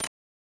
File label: Glitch, One Shots, Glitch FX